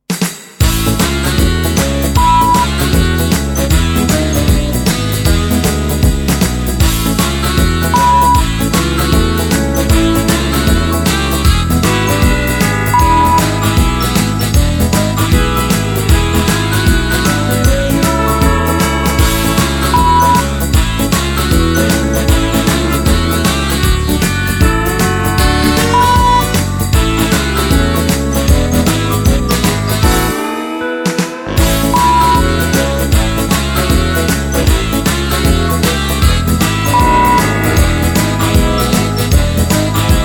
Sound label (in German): XMas Boogie